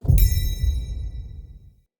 Short Sword On Killed Hud UI; Weapons; Player

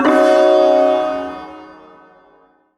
Ersatz Shawzin Yo Chord D; Warframe; Duviri

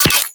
Pistol Projectile Attach; Guandao

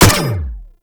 Energy Rifle Shot; Weapons

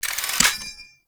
Pistol Reload; Warframe; Grineer; Orokin

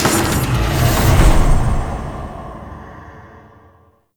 Orokin Derelict Vault Door Open; Warframe; Doors

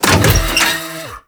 Thumper Bot Leg Up; Warframe; Grineer; Movement; Legs Up

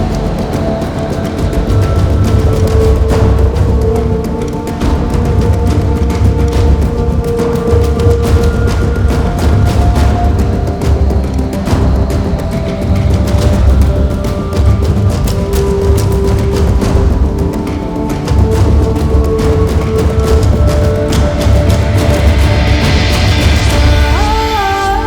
Cinematic, Music; Warframe; Eidolon Plains; Glass Quest Operator Amp

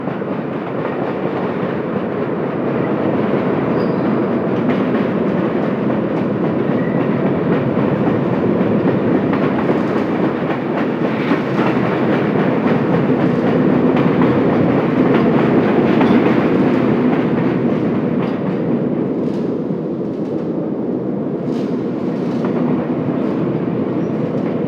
Asteroid Interior Amb; Warframe; Grineer Asteroid

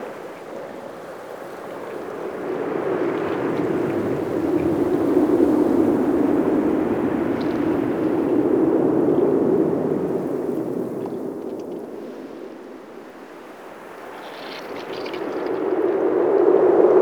Exterior Amb Bed; Tau

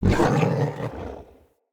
Battle Dog Wake; Animals; Vocals; Snc Battle Dog

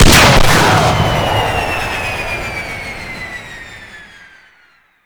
Pursuit Spaceship Engines Shot Out; Warframe; Grineer; Vehicles

